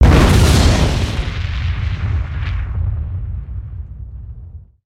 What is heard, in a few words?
damage vehicle; World Of Warships